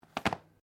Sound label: Book Place; Book movement; Offices